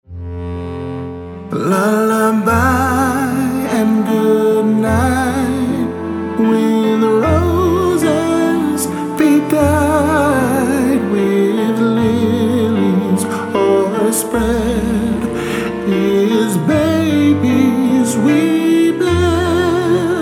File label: Cradle Song, ballad